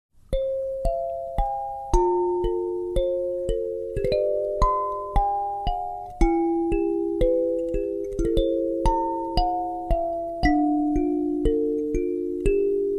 sad kalimba

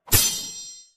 parry; deepwoken

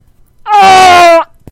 hhahahahahaaaaaaaaaaaaaaa

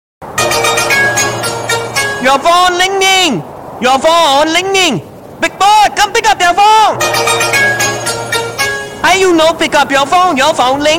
your phone ringing; sonic

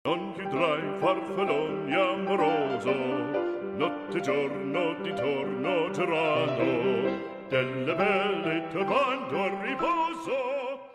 haheha

